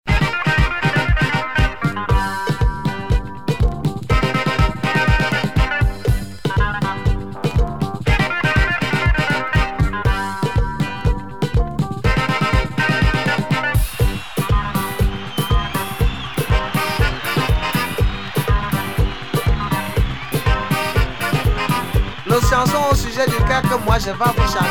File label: caribean song